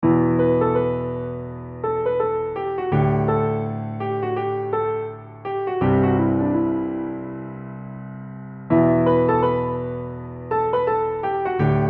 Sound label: advanced solo, piano